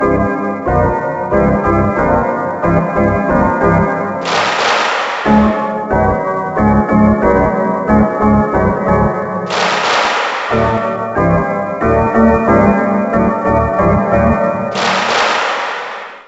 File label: Ditty; NBA ShootOut 2001